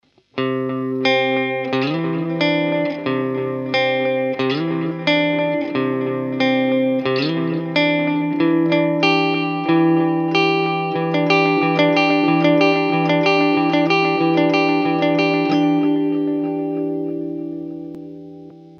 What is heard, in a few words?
Multi head echo